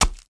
knife stab; weapons

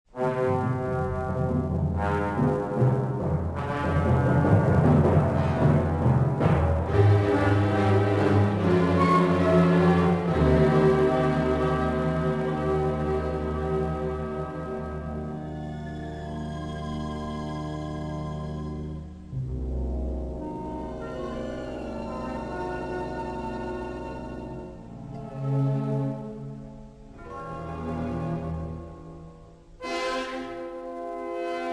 dark explore, music; Star Wars Jedi Knight I Jedi Academy; XBOX

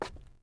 thug fs creakwood, footsteps

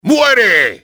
die; Soldier Of Fortune II Double Helix; XBOX; sanchez

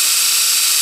brake, sled; WhiteOut

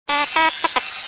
mouse go; Star Wars Jedi Knight II Jedi Outcast; XBOX

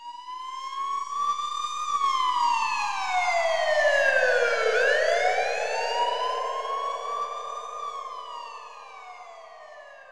siren; lasvegas

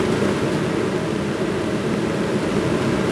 conveyor belt; Soldier Of Fortune II Double Helix; XBOX; ambience

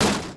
bike hit, Impact

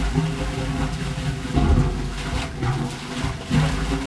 Pipes Flowing; ambient

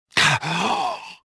gasp; reborn

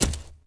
blaster drop dirt; objects; Star Wars Obi-Wan; XBOX

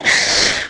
Arachnid Attack, Aliens

